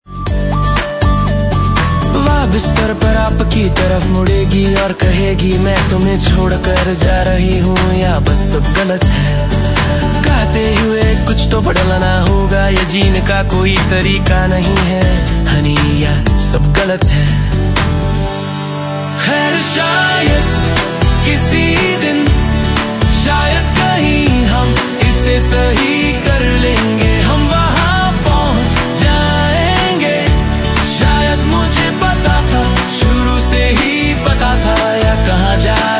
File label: HINDI Bollywood